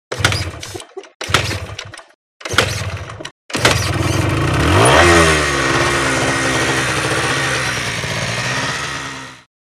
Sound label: Start Moped Stand Dow